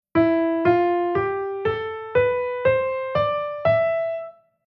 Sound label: E Phrygian